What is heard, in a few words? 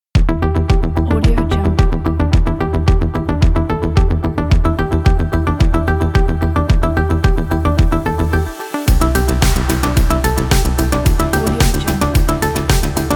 80s synthwave